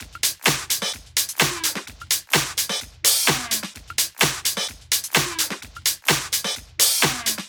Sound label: 128BPM Kit; VFH1 128BPM Bones Kit